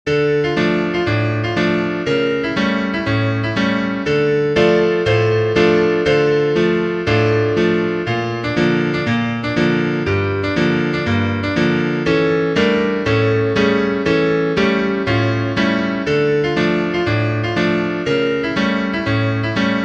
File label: piano, sheet music